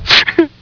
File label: whimper